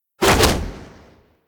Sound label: Playstyle Melee Damage